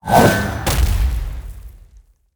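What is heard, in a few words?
Owl Bear spawn; monster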